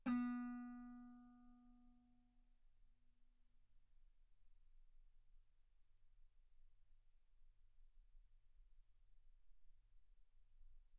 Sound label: Guitar B3; ff; sul B